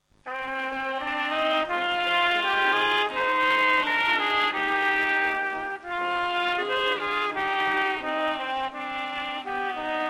Научи меня Боже молиться (Духовой)

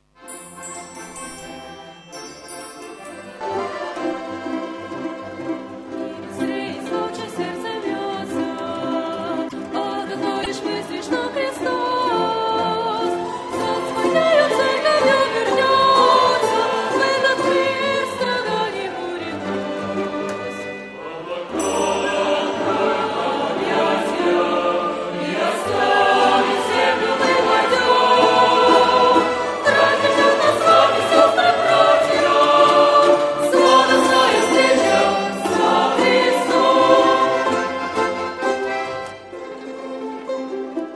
Хор; Молодежная конференция Курганинск

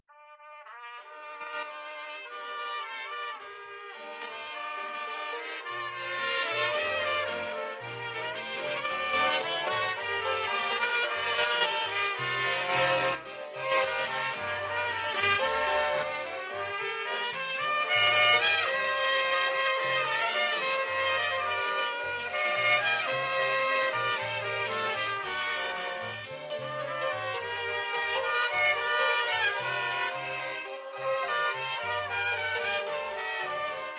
Духовой; Молодежное Лучистое

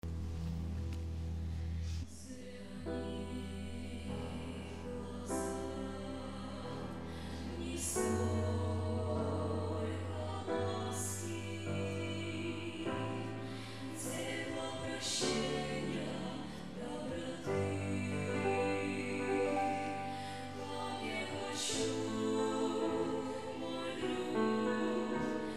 Orkestr s peniem; Vankuver; Saturday Youth service; Молодёжные общения

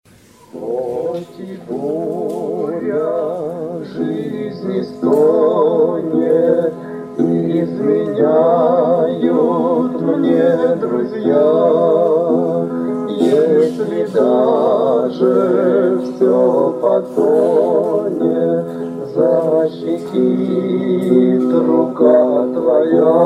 пение; Общение памяти Н.Г. Батурина; г. Шахты

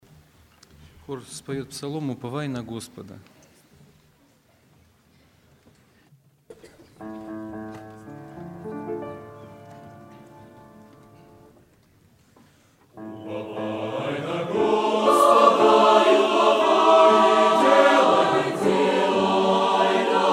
хор; Ковель

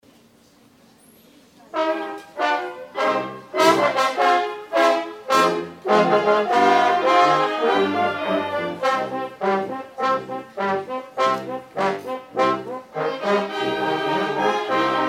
Духовой оркестр; 2016 Апшеронск, Подростковый лагерь

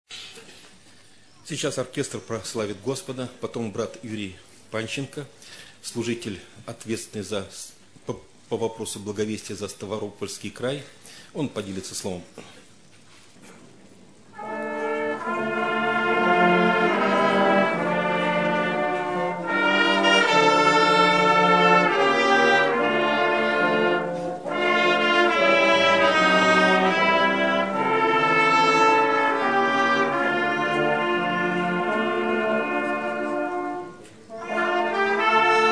оркестр; Похороны М.А.Горянина

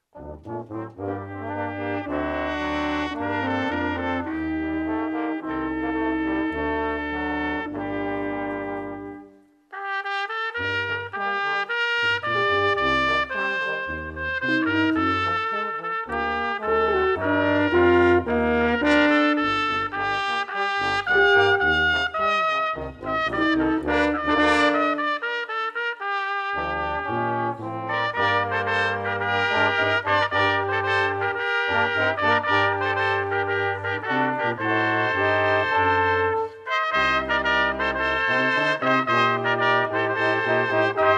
духовики; Года проходят не заметно; Молодёжное общение г. Апшеронск

